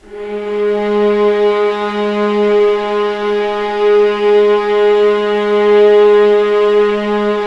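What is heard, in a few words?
G#1 legato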